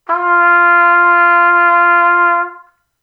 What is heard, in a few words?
trumpet